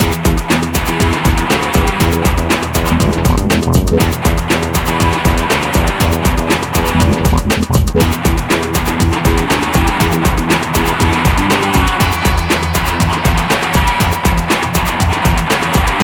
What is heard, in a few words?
Guitar Beat; yager windows xbox gamerip 2003